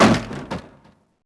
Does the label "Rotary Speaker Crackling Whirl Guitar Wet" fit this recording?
No